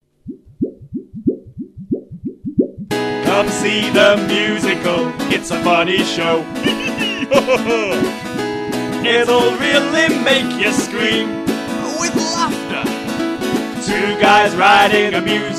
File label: jingle hi; Promotional jingle - "Come see The Musical!"; Ashley Frieze and Chris Parr